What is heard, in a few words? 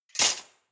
cutlery large multiple drawer place hard